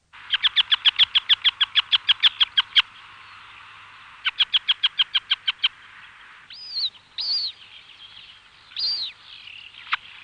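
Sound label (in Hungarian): Hamvas rétihéja; Madarak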